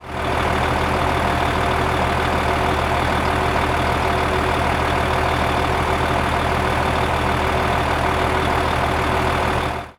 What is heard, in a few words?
truck idling; transportation